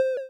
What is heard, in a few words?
Blip